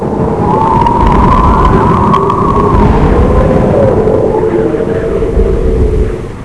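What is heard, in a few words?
hurricane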